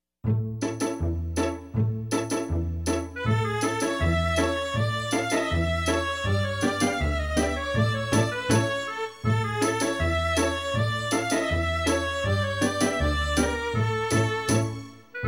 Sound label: Jewish dance music